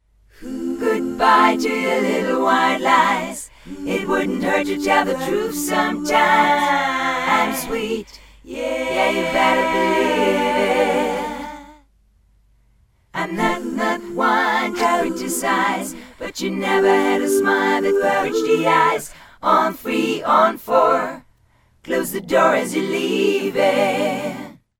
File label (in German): Chor